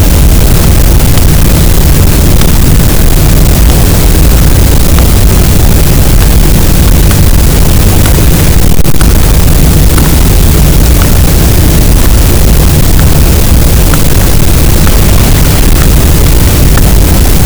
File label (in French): cachalot, Physeter Macrocephalus, gaussian noise